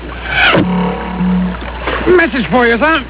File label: arrow in tree; Arrow sound hitting a tree followed by a British accented Message for you sir.